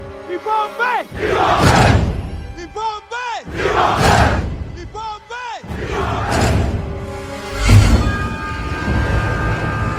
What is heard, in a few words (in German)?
wakanda schlachtruf